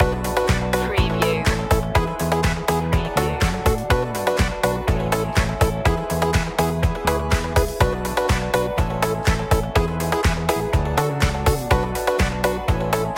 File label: having fun loop, Pop Funk